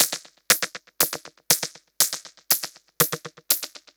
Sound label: Modular Noise Rhythm (120BPM); noise hiss crackle